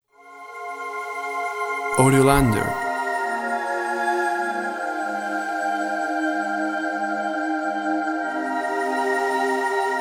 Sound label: Ocean Cry